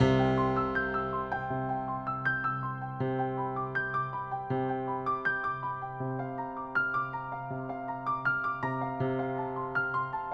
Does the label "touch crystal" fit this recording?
No